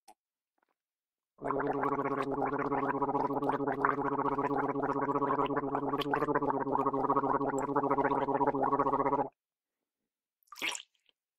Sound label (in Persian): gargle, صدای غرغره کردن